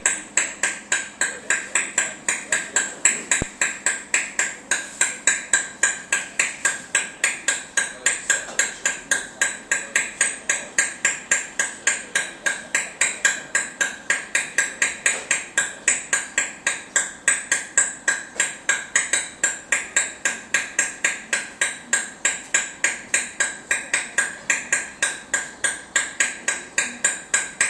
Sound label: what a dying freight train sounds like; 92015 southeastern mde